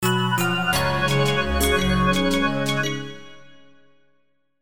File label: Jingle Radio; Entre Deux